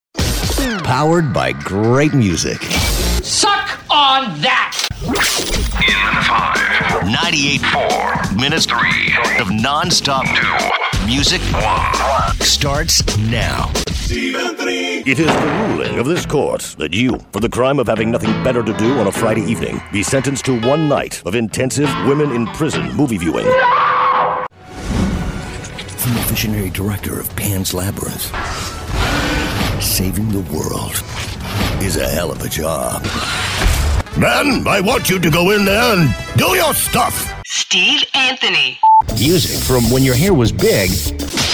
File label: Rock Imaging